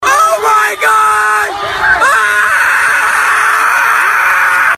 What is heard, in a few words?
oh my god aaaaaahhhhh